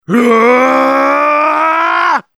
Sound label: Charge; "Raaaaaaaghh!"; Versus Saxton Hale